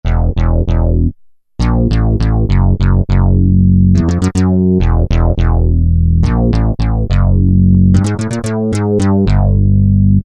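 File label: bass Syn, Yamaha TG500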